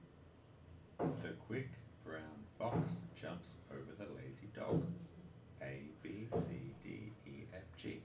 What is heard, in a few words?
recorded audio bandpass; serial audio catcher